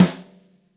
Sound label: Gretsch Snare Room; 1967 Gretsch Kit; One-Shots; Sonic Foundry (Sony Creative Software); Vital Drums